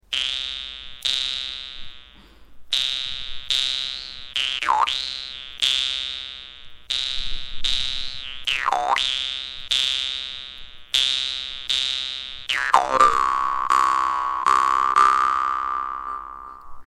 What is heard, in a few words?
doromb; zulu